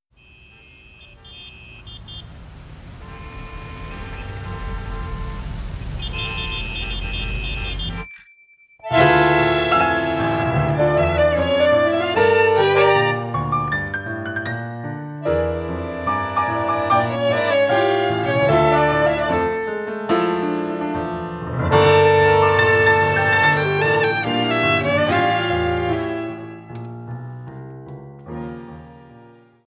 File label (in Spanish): Tango progresivo, Tango